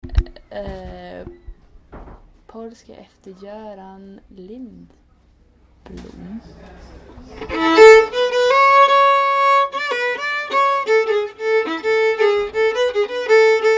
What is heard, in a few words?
Polska; Polska e. Jorgen Lind